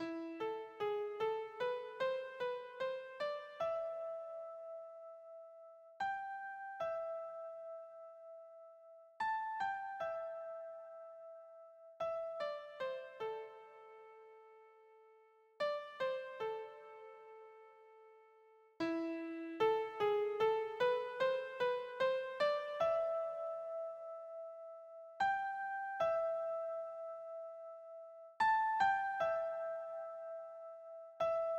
el condor passa, keyboard

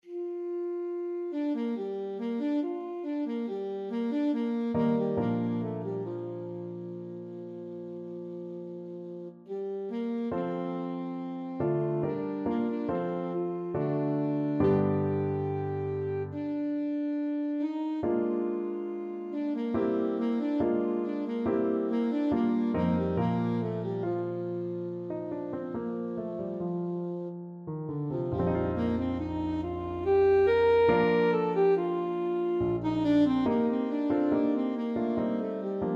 debussy flaxen hair, saxophone

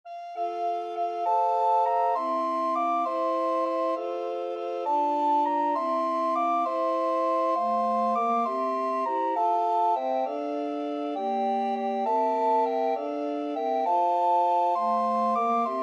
recorder quartet

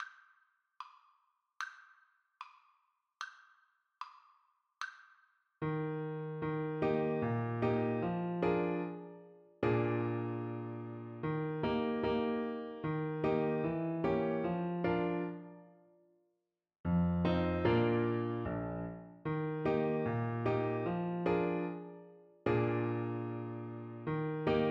rosita, Trumpet; tarrega

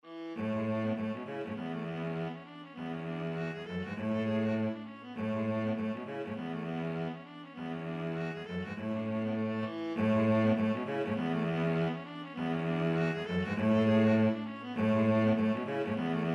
midnight dance, viola cello duet

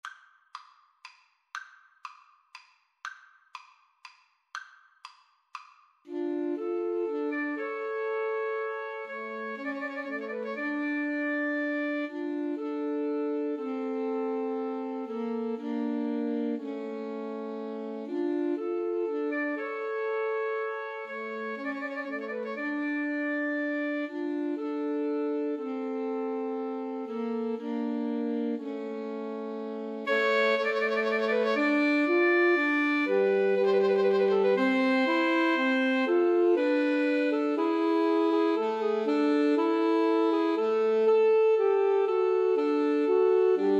pastorale Alto Sax trio

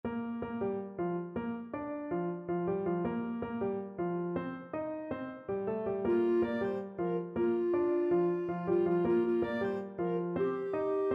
jingle bells salsa, clarinet

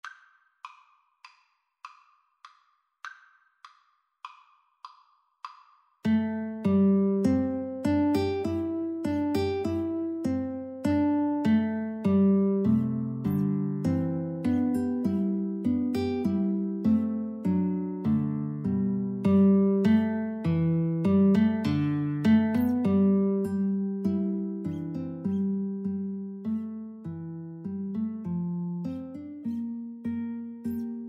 mussorgsky, 3 guitar trio

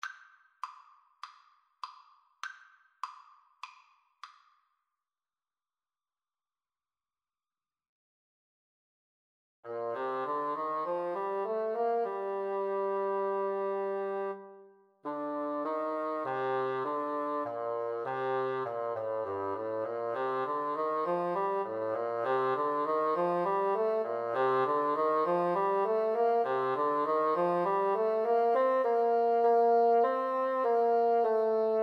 bassoon duet; submarine warfare

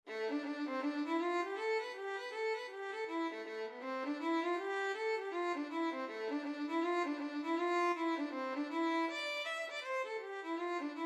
violin

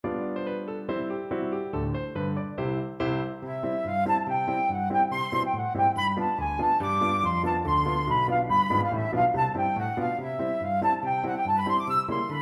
flute

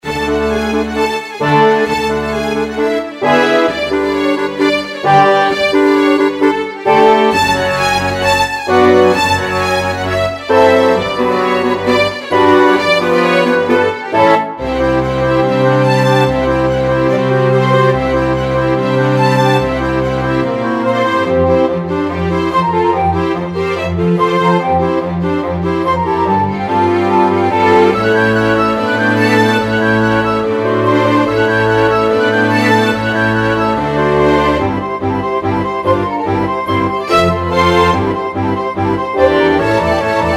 orchestra

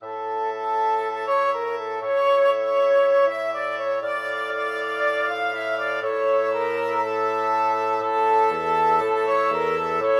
flexible mixed ensemble, 4 players

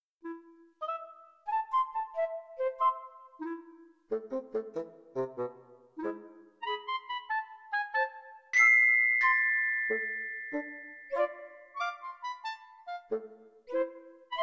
wintery forest parade, wind quartet

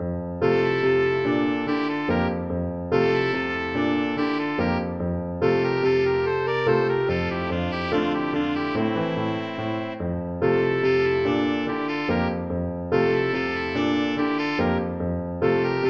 trumpet saxophone duet; lakme; ASAX

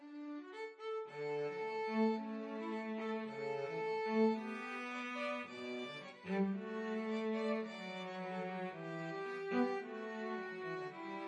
violin-cello duet; schubert sym 5

